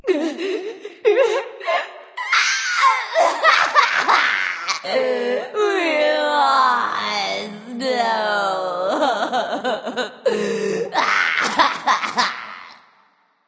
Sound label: boo hoo; smite; voicepack